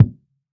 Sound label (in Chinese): 敲门 kick！